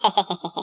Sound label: laugh; Team17 Test